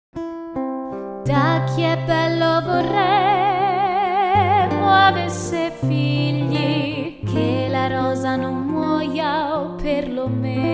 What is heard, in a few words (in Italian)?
sonetto solo chitarra